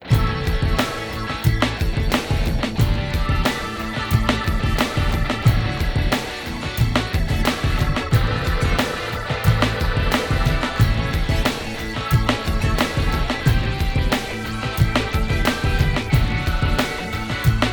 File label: Hip Alterna; ADOBE AUDITION (Cool Edit Pro2); Music Beds